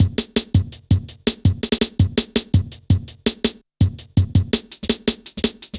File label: Skippy, Drum Loops, Jungle; Zero-G Jungle Warfare 1, 2 & 3; Skippy A 165.5